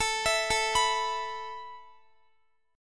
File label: A Suspended 2nd A Guitar; Asus2; arpeggiated; x,x,21,21,21,19